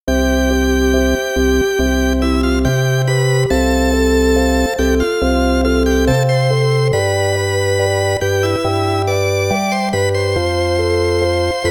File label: combo organ model v; Mixcraft